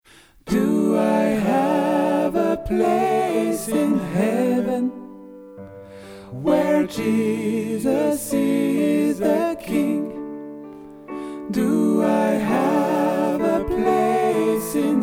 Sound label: Sopranos, Gospel